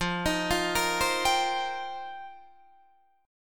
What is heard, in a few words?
F 7th Suspended 2nd Suspended 4th Guitar DADGAD, chord; F7sus2sus4; 15,18,15,15,15,17; arpeggiated